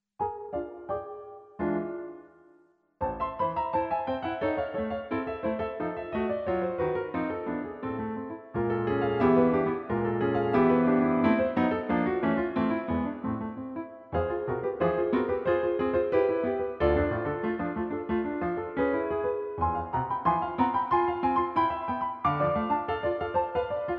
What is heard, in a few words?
poulenc soirees 8